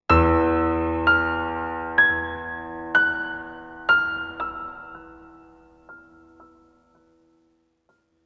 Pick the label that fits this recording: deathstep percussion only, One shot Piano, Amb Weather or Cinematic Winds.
One shot Piano